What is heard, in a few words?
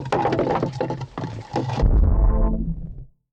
Designed Rocks - Melodic; Gregor Quendel